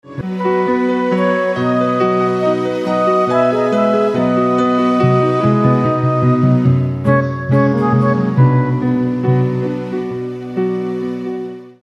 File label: Instrumental One Drum; Christmas